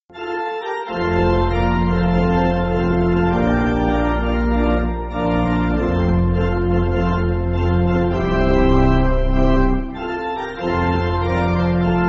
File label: Pipe, church music